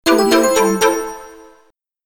charm air finish

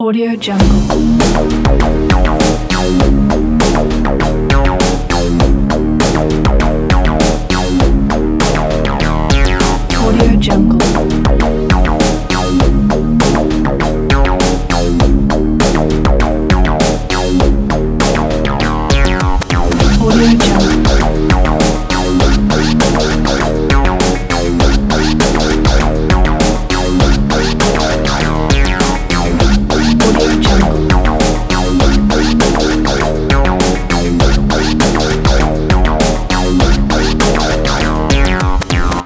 breakbeat trailer

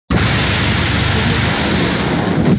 flame thrower burst

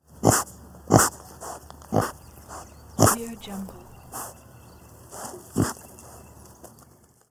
pig snorting

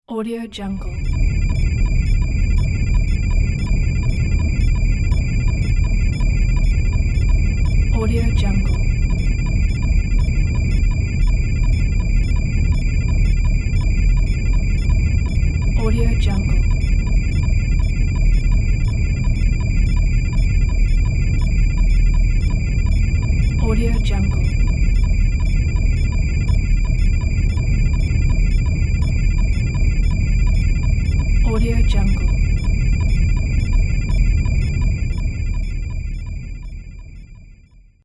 spaceship

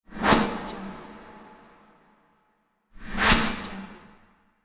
whoosh sound

